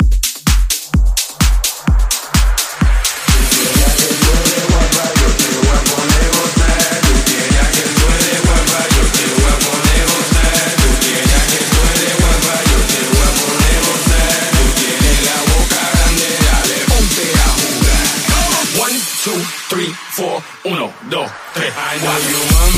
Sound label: Hard House Snippet 128bpm Dm